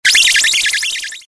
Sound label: mad bat; Robot Wants Fishy; BigBat